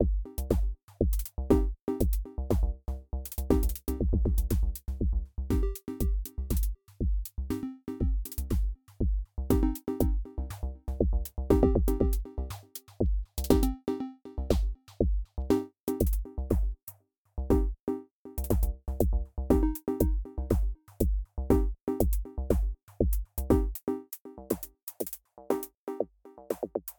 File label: EQ; sumFX